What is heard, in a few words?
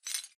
imp bullet lightballistic tile debris; Titanfall 2